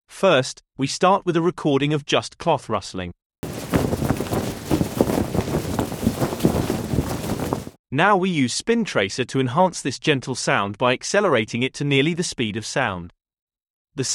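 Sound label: cloth thunder clap sound effect; spintracer; tonsturm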